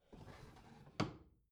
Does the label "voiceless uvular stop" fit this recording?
No